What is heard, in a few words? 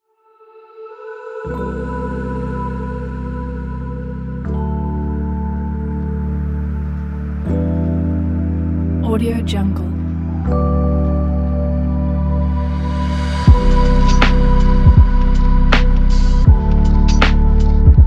lofi dreams